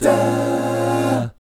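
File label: B flat minor 7, Jazz, 2 GUY CHORDS; ILIO; Vocal Planet VOL-3; Jazz & FX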